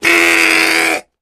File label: Air Release Funny